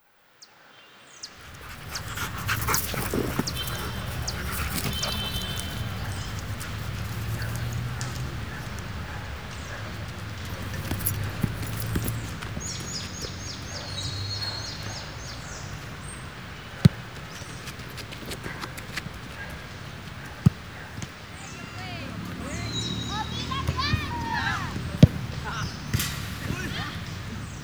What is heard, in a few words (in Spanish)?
Bosque